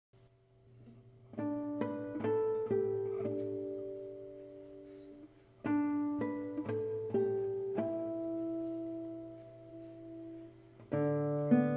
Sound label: jingle, guitar